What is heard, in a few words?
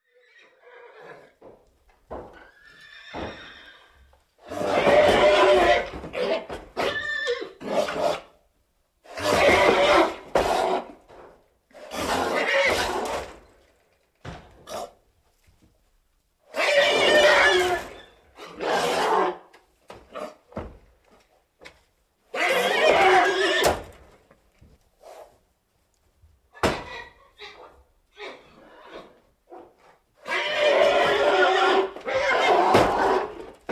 Horses Whinnying Sto